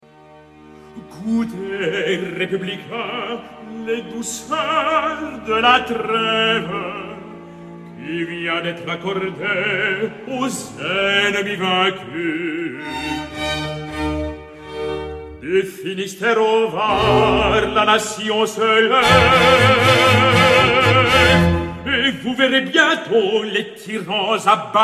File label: Recitativo; Goutes Republicains